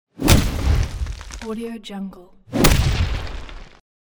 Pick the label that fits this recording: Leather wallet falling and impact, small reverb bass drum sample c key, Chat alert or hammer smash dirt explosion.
hammer smash dirt explosion